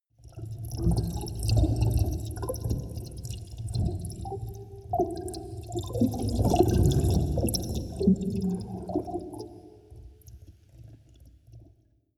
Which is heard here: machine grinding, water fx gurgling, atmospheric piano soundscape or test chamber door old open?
water fx gurgling